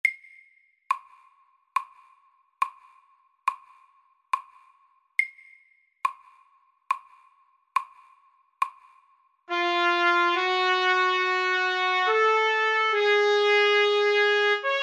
Etyde i Giss moll SAKTE

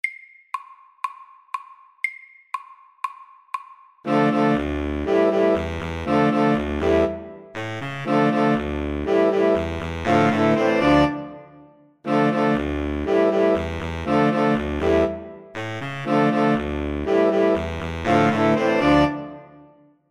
Jam MIDDELS

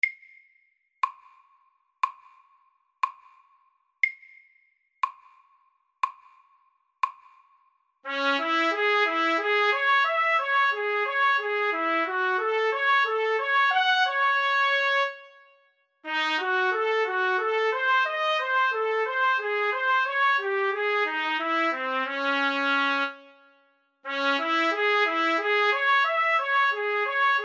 Etyde i Ess moll SAKTE

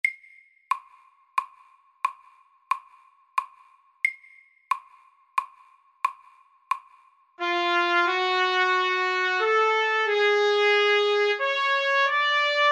Etyde i Giss moll MIDDELS

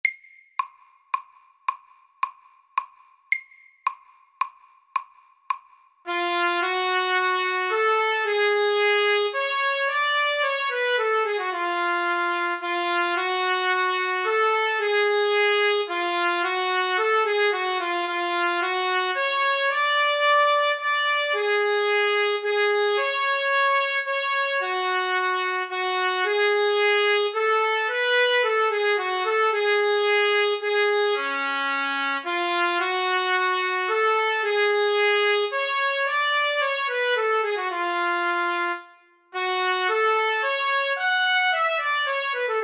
Etyde i Giss moll FORT

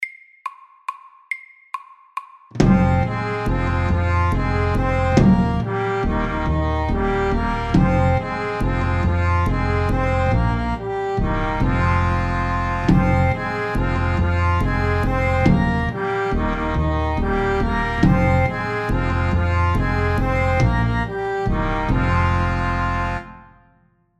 Sjanti MEDIUM 140

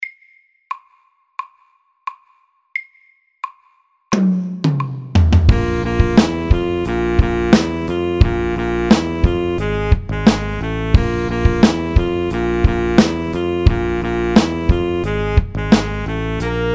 funk MIDDELS

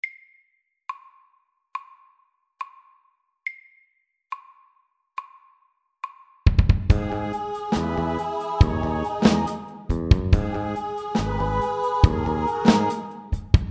Octave rap MIDDELS

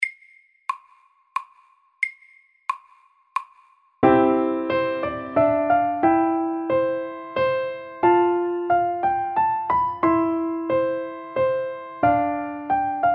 Menuett SAKTE

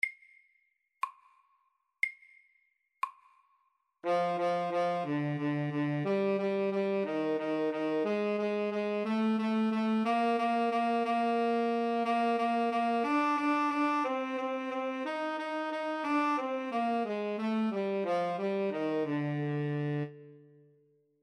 Etyde SAKTE

